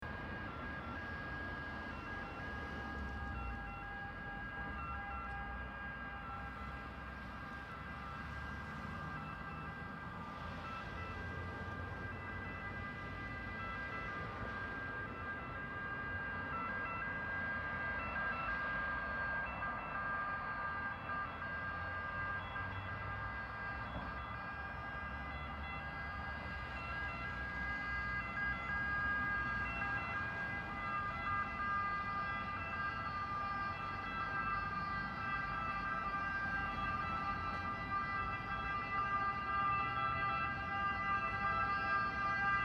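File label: sirens in the night city, fire truck; pozharnoj mashyny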